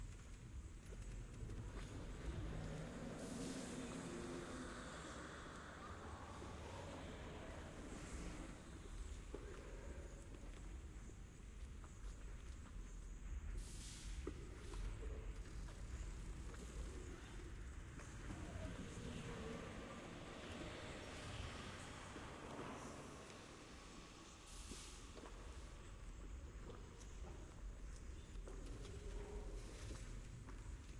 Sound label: amb labs; piltover zaun zaun